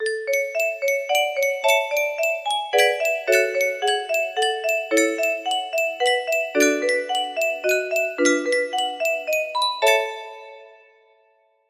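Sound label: chimes, music box melody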